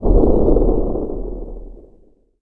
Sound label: attack